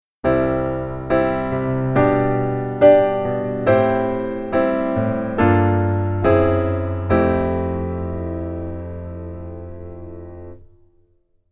keyboard top note

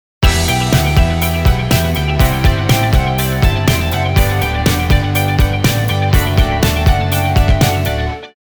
guitar pattern

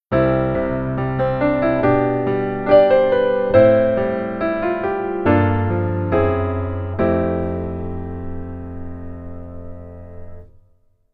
Keyboard

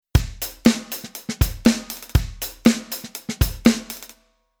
Rhythm Funk

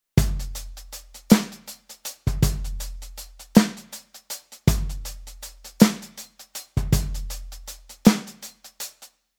Rhythm 12 8

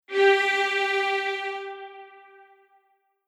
Strings Layer Attack

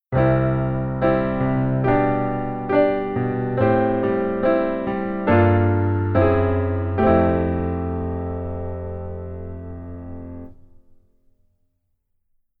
Keyboard

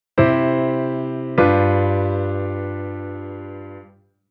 Chord Cadence

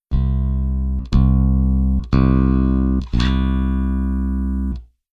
Bass Velo; Trilian

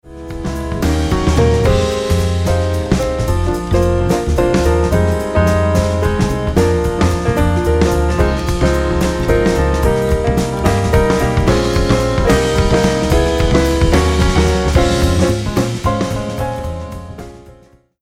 Mix Piano

